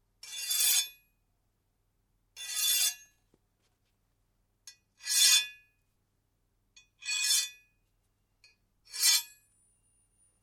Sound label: Blade Scrape Sequence, Sword; Foley sounds for melee weapons; Combat